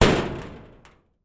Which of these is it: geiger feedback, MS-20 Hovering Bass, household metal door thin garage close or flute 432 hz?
household metal door thin garage close